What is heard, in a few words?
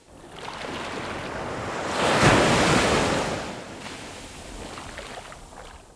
sea; EnvSound